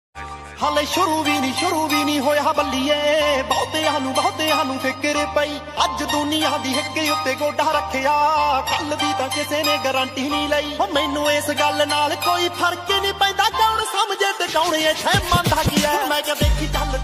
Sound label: Dance Punjabi Ringtone